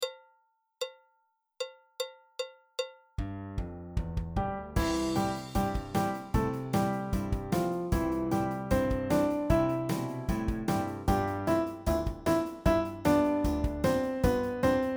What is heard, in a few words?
Backing Track; The Yellow Rose of Texas